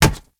Kubrow Run Metal, Footsteps; Enemies; Warframe

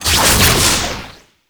Medusa Puzzle Orb Spawn; Warframe; Duviri

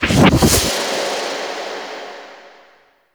Evil Twin Warp; Gameplay; Warframe; Chimera Prologue

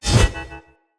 Mockery Weapon Common Throw, Swords; Weapons; Player

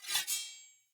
Sphere Dual Sword Accent Blade; Weapons; Player; Swords

